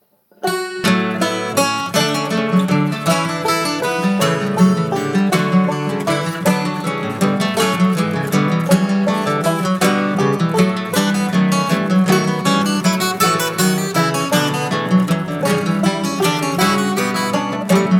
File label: Classical Jig